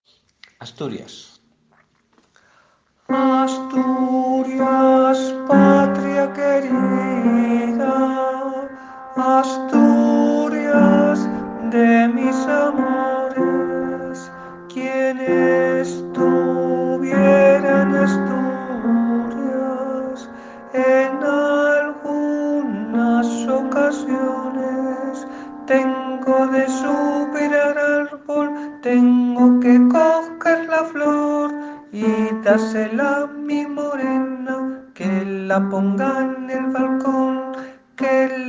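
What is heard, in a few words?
tenor; Asturias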